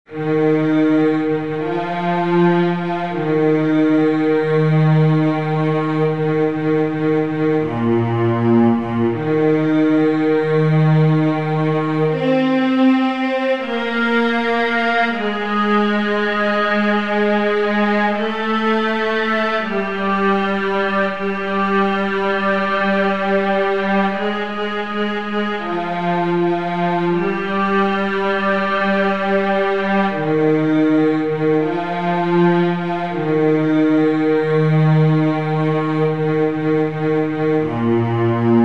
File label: bass